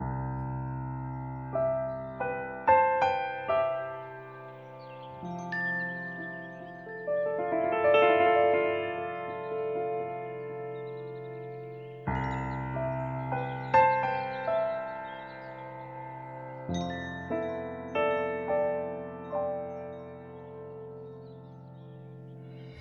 Forest Whispers, alarms; Honor; Magic-7-RSR-Porsche-Design

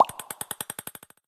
Ping Pong, notifications; Honor X9b 5G

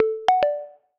Particle, notifications; Honor Pad X7

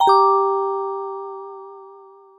Bling, notifications; MyPhone A919 Duo

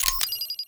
CAM CLICK, camera; RED Hydrogen One

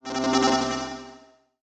Notification

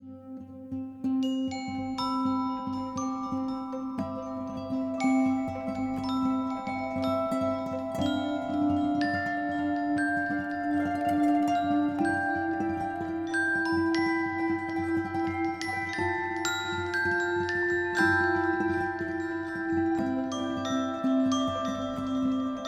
Raindrops, alarms; Honor 600 Pro China

